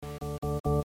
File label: Earcons; oviatt